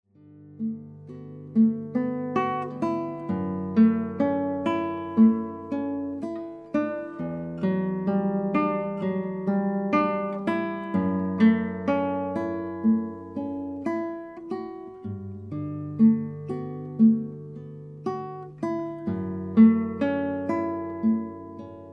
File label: Musical Mantras